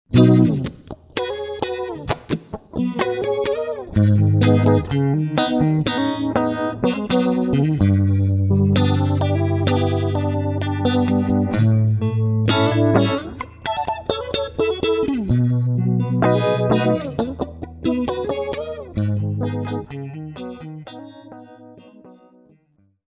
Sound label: UVIBE